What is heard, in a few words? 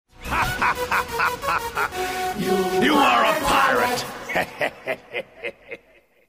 pirate hehe; css